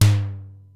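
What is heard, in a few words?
Simmons SDS-9 mid tom, Drum Machines; Factory D5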